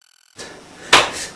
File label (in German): ohrfeige rechts